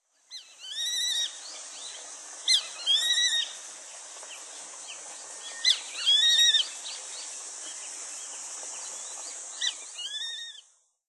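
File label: grey-faced buzzard, ichikai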